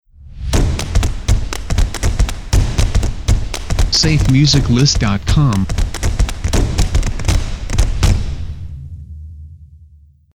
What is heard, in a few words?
Stomping Intro